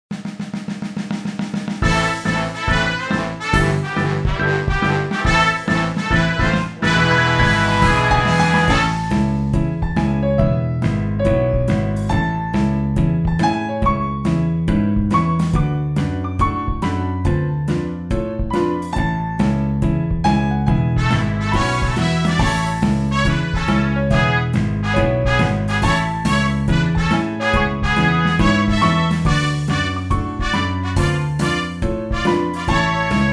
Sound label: swing